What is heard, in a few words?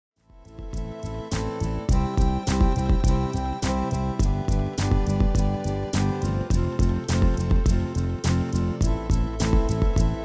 MIDI